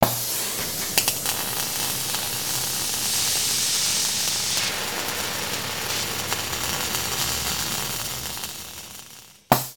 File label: welder WELDING; foley